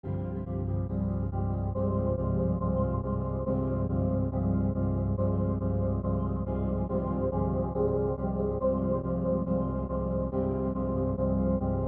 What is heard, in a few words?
useable loop